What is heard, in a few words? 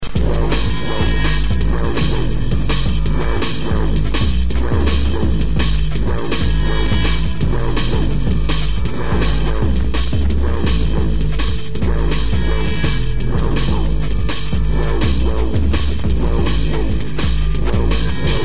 jungle drumnbass raggajungle breakcore